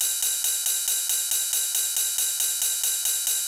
FAST RIDE, Techno 138 BPM; Best Service ProSamples vol.54; AKAI

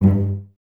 CES G Pizz, Cellos; Miroslav Vitous; String Ensembles

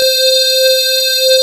JU PULSE; Pro-Rec - Synthsonic; JV ANALOG

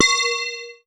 BELL-GONG, A#; Big Fish Audio

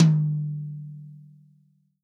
TOM, Real Drum Kit, POP KIT

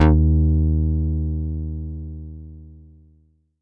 Fatboy Tight d#3, Korg Poly-800

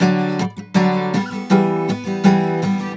AM GTR, Celtic Flavours; USB Soundscan vol.42; AKAI

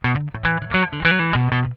SWING IT, Funk Guitar; Zero G - Funk Guitar

